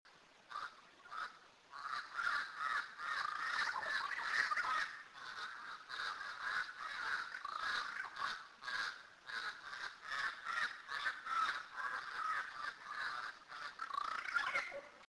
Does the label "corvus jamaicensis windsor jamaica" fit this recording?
Yes